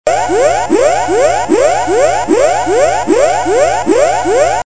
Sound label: tone